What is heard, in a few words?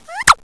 sneeze